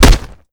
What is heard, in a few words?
long punch; Call of Duty Modern Warfare